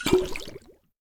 Potion Sickness Over

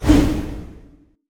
Dash

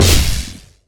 Tesla Shoot

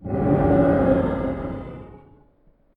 Wyrm Roar Close

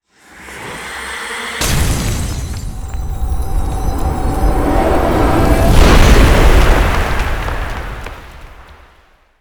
death animation; Providence

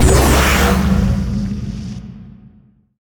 Spawn

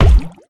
Clam Impact

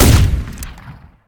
Plasma Rifle

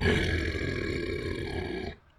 Angry Dog Growl

